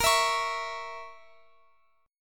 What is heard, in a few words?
Bb Diminished Bb Guitar Open F; Bbdim strummed